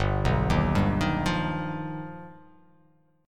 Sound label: G Augmented 7th; G+7 arpeggiated; 3,2,1,0,3,0,x,x; JGuitar